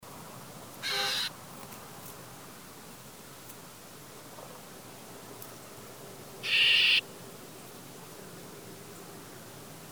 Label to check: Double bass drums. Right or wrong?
Wrong